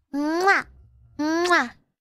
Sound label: muach; vestia zeta